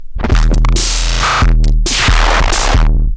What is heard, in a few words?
fx dead snare